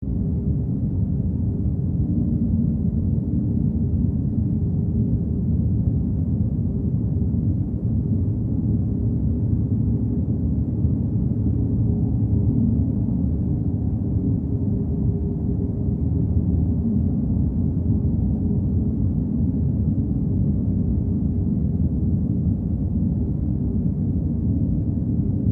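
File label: Ambience Alien City Loop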